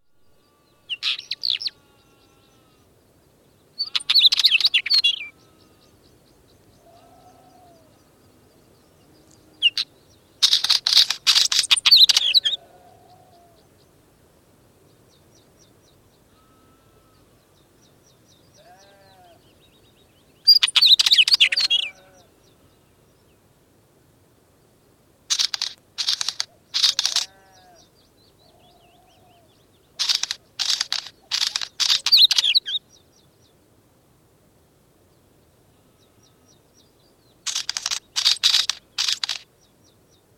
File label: Wheatear singing, with Sheep, Cuckoo & Willow Warbler in background; Bird; Cuckoos; BBC